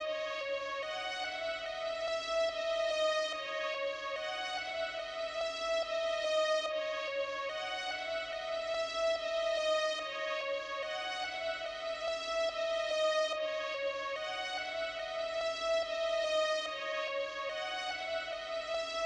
144 BPM C# Min Violin; Loop Stems; Cymatics; Warning; 2022 Melody Collection; Drill

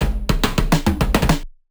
Riddim Drum Fill 140; Ghosthack; Riddim Essentials Volume 2

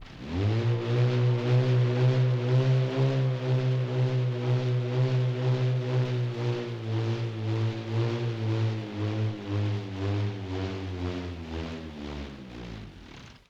LoFi Motor Whir Down; Vintage Cartoon; Foley

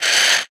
fx scrape

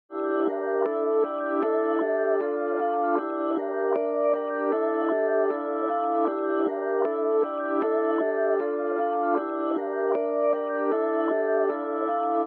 155 BPM D Min Main Pad; Reverse; Loop Stems; Cymatics; Throttle; 2022 Melody Collection

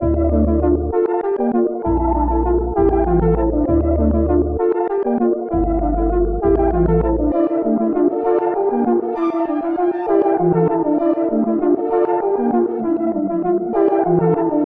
131 BPM D Min, Melody; Cymatics; 2022 Melody Collection Platinum Expansion

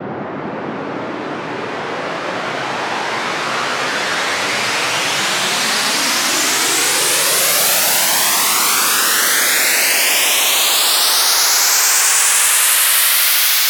Riser White; Riddim; One-Shots; SFX; Ghosthack; Riddim Essentials Volume 2

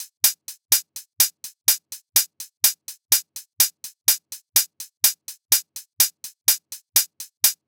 Slap House Kit 125bpm Hihats; EDM Drums; Drum Kits; Stems; Ghosthack; Ultimate EDM Drums; Slap House Kit 14

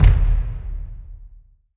Low Perc; Drums; One Shots; Ghosthack; Drum Mega Bundle Demo; Quantum Force 4; Acceleration

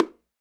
Bongo, Hip-Hop; One Shots; Ghosthack; Drum Mega Bundle Demo; Hip-Hop & Lofi Drums

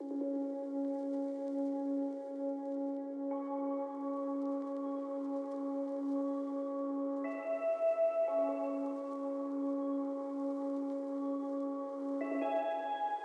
145 BPM C# Min Pad; Melody; Trap; Loop Stems; Cymatics; 2022 Melody Collection; Ferrari

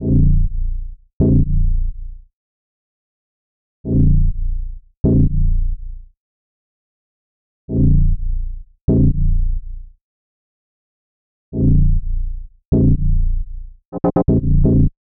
125 BPM A# Min Bass; Melody Loops; Loop Stems; Cymatics; Antidote

